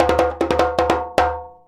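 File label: 100 JEMB, djembe; Univers Sons; Basicussions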